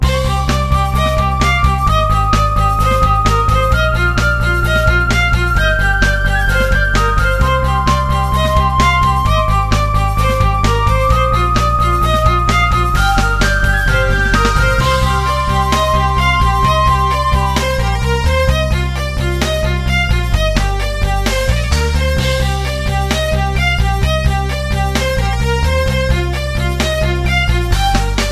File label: battle